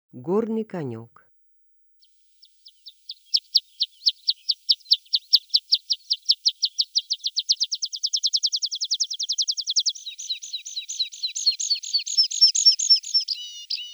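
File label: water pipit voice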